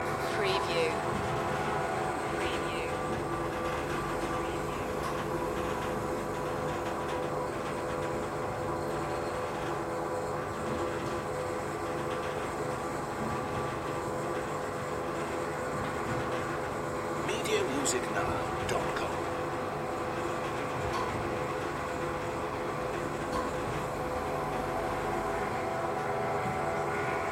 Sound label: elevator ride loop